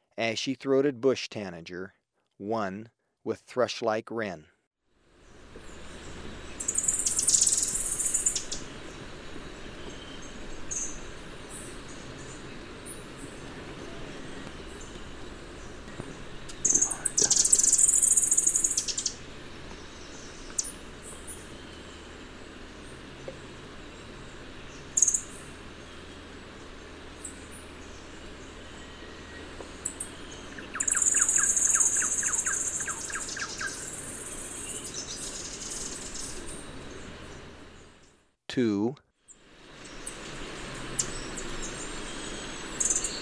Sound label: Ashy-throated Bush-Tanager; S. America